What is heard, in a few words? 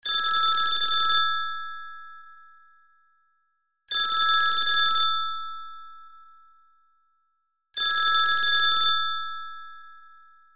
ringtones old phone, midi